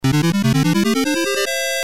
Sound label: Slots Win